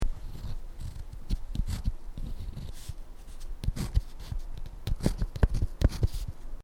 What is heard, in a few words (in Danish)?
blyant